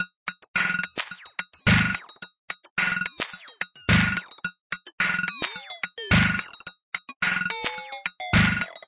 108 bpm bleepy rock ring moder, drum loops and breaks